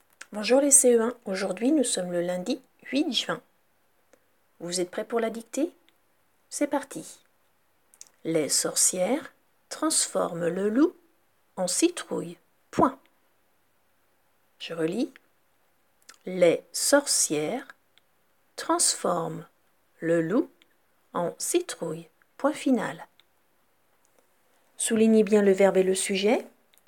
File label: Vocal